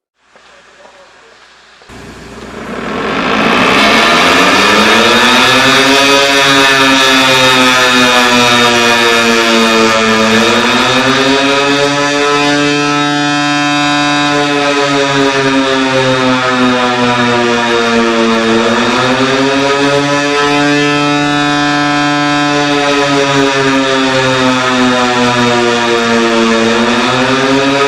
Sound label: deep sounding siren